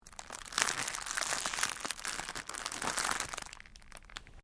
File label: Cellophane crinkle; Material components for constructing more complex sounds; Plastic; Materials